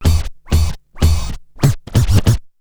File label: MUSC SCRH; BEAT2000